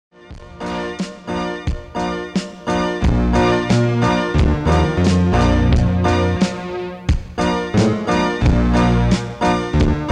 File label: reggae pop nice but rough